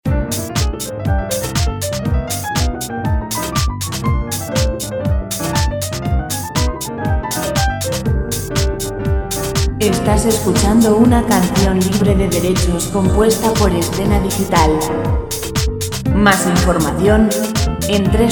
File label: jazz royalty free music; jazz background music